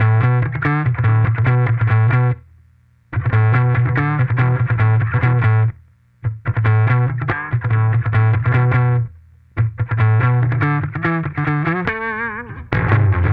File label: Bass